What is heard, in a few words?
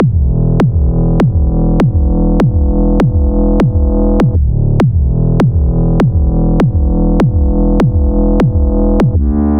loop bass drums; Nurykabe